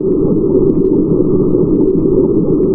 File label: sci-fi forcefield hum loop; Space Wave Race